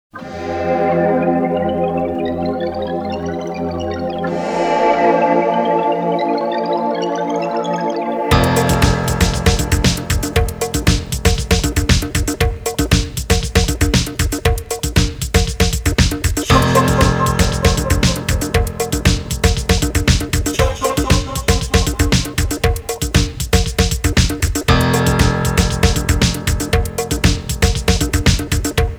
soy pijo instrumental

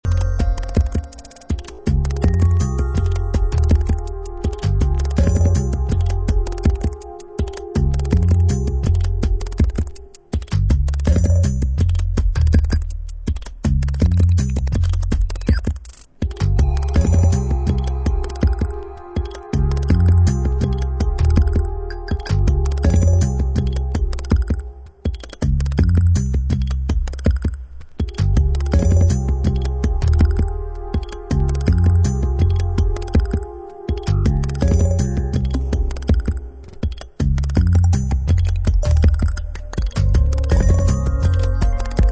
ionized ping

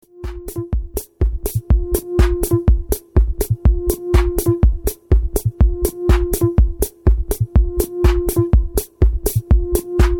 bleep

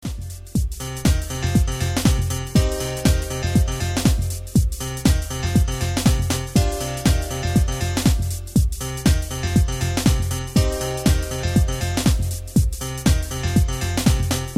Trance Dance

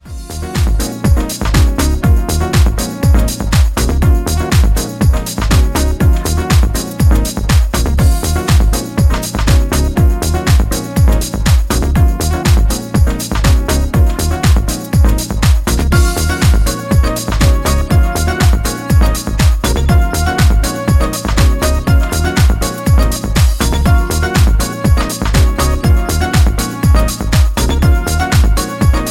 Atmosphere

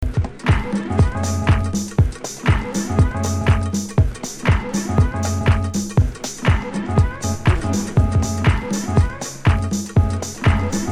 Broken Keyboard

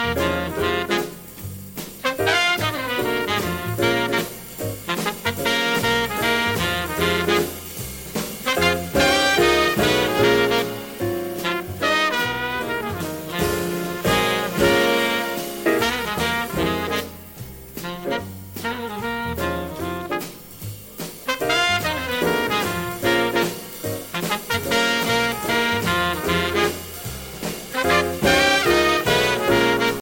Blues

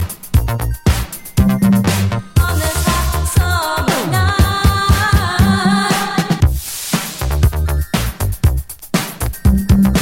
(Instrumental)

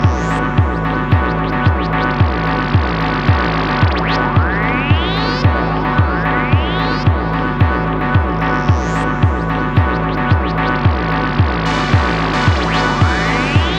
Digital Clap Trap Promars Prophet

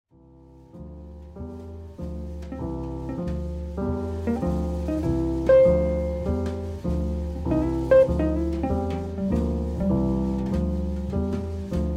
slow blues

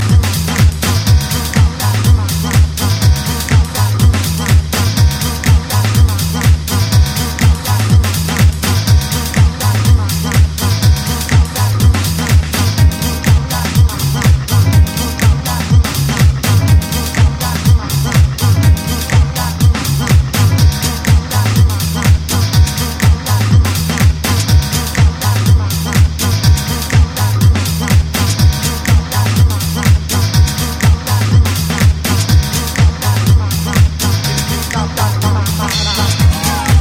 Jazz Impressions

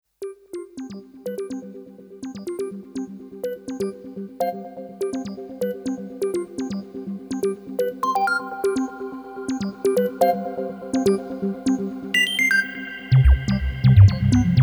Blip

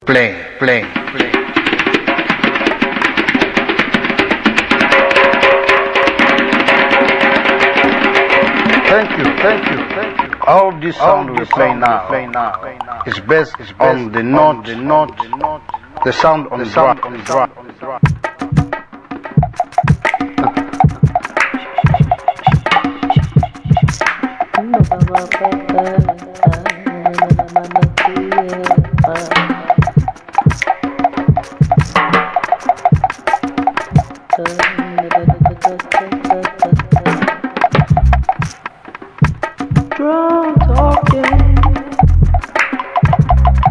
drum talking

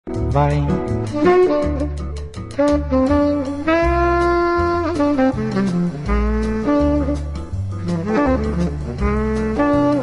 Samba

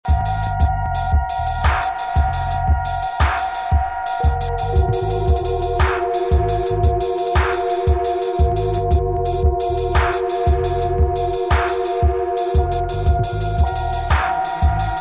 dance of the drunken drums

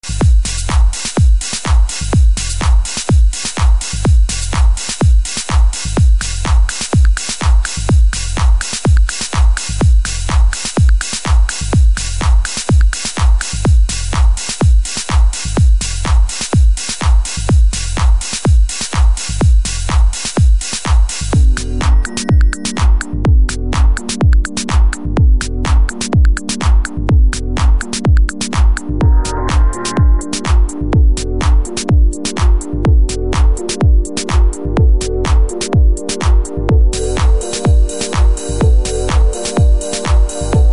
(beats)

